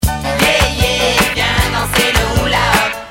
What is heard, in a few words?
hula loop